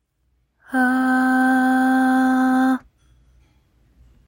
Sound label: Alto C4 "ah" vowel